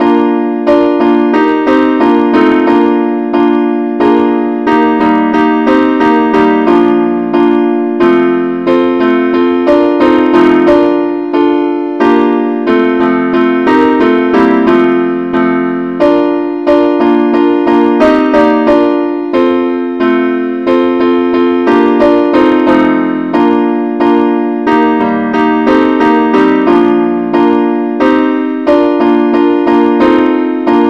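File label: advanced accompaniment key E flat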